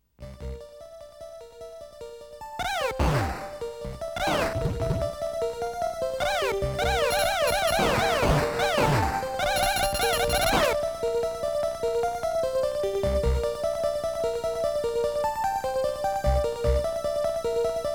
Scroll Screen BGM ~ Boss Explosion ~ Battle ~ Boss Explosion Gameplay Sou